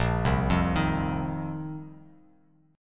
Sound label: Bb Suspended 2nd Suspended 4th, Bass Standard; arpeggiated